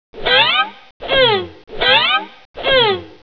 SWINGS; T.J. Finds a Friend

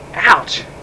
ouch beep; Short Miscellaneous; Wayzata Technology

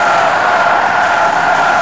SQUEAK, SURFACES; ImmersionRacing

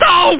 drop all; dynamite

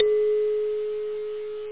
high bell; drums

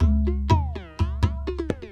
PERC LOOP ETHNO 125; eJay

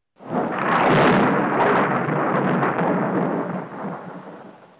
Lightning Exit Windows; Christian Desktops for Win98

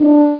horn

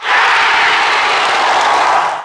alarm, limit reach; axisdemo

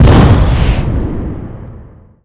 explosive explosion effect; Earth and Beyond; EA Games

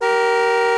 Horn; UrbanChaos

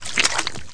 WET FEET; REDNECK; RAMPAGER

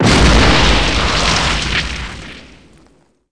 Building Explosion; Red Shark

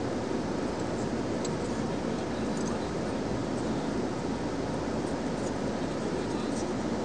AIRPLANE; ATMOS

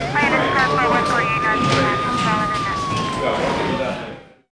POLICE; buildings

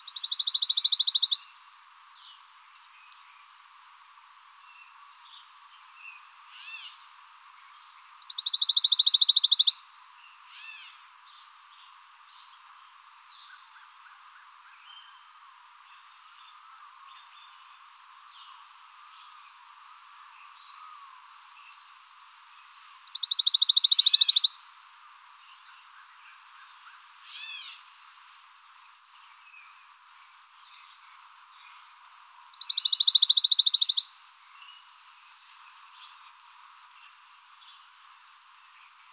Pine Warb, Birds, Coastal Louisiana; Explore Coastal Louisiana with Boudreaux

